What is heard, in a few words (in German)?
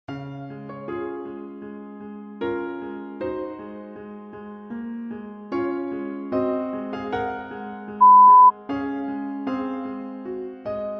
Klassik Klavier